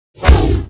ATTACK

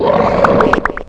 MONSTER ESCAPE

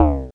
CLOSE WINDOW

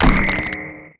MUSIC STONE

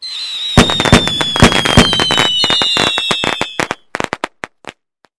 fireworks

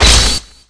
BLOCKING; ATTACKER

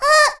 BEATTACK

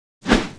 attack

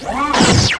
ATTACK

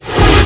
ATTACK

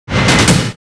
ATTACK

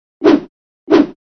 emoticon

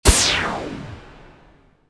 ATTACK JUMP

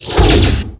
attack

